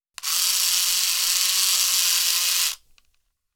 kodak wind

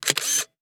shutter click

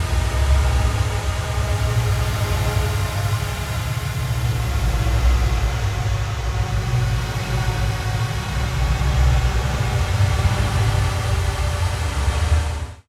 solar winds

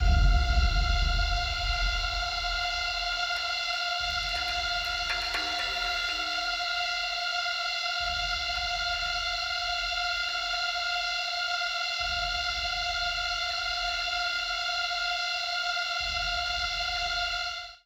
air

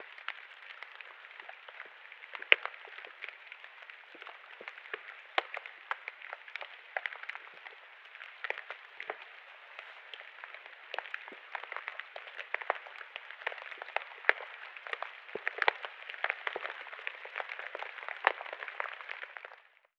egg fried rain

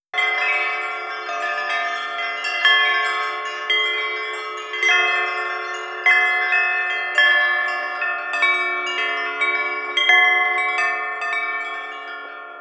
chimes

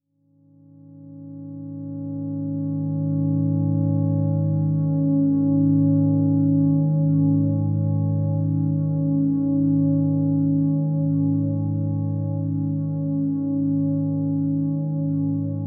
drone

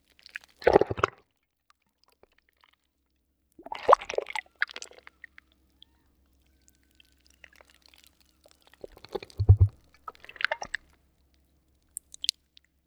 gloop sea

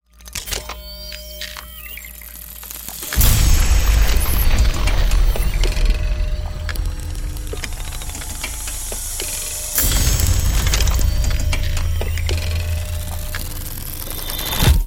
switched on explosion; manuella blackburn

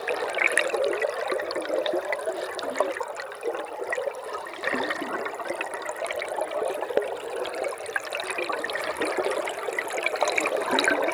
under river

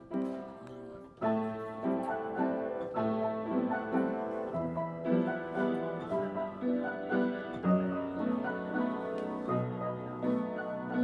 piano; mashteuiatsh

